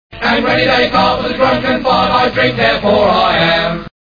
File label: drunken fart